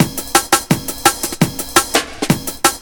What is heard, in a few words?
Break, Loops; M8